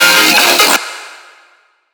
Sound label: Colorfill, fills